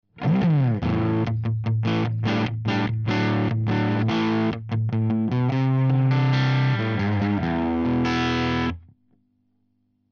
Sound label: crunch; C414